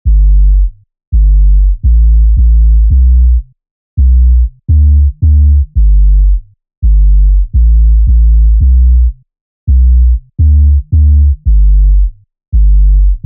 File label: bass